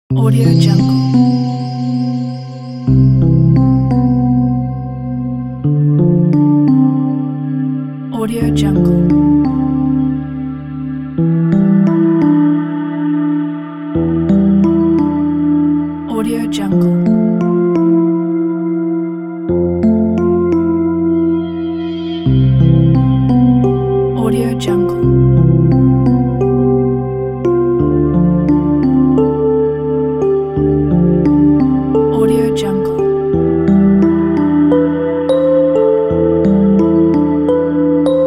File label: childrens lullaby